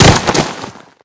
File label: flint fall; Golden Crayon Sounds